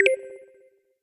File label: UI Confirm; They'll Come from the Moon